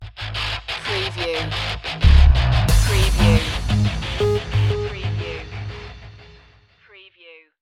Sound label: agony stinger